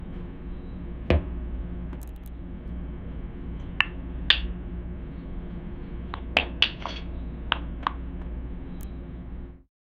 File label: seamless looping, chiptune